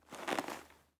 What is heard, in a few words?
Leather Creak; Welcome to the Game